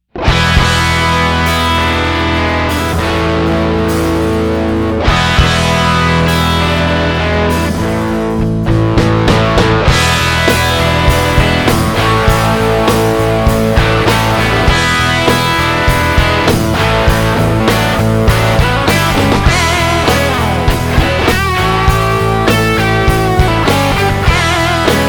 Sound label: Tech21 British